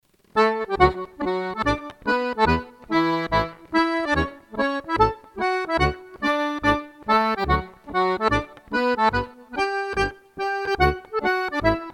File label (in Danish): Schottische Reinlaender